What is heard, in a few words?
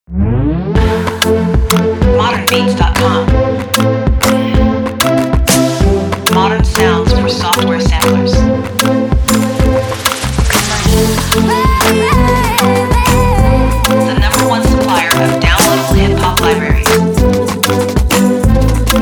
Supreme Snarez